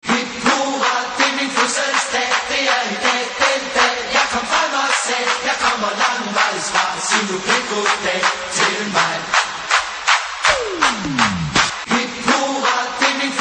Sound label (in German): Hip Hurra